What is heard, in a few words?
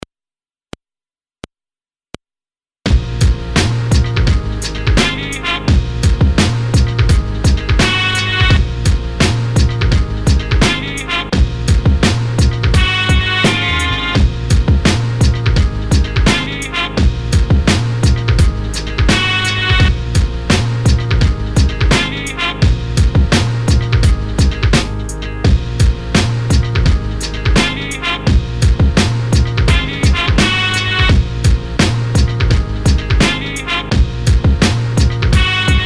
Beat